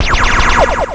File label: hum shot